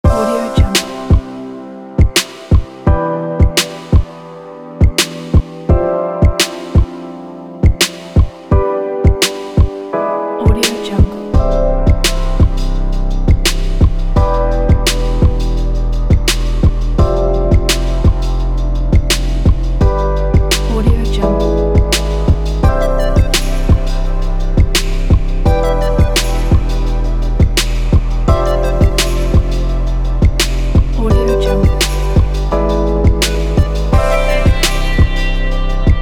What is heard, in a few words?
light backstage